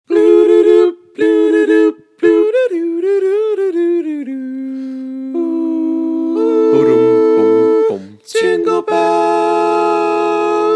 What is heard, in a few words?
Jinglebell Jingle; xmas